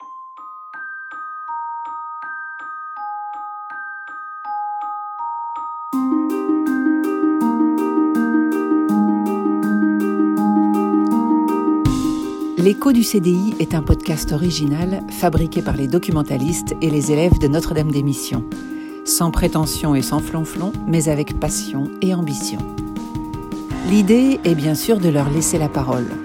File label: jingle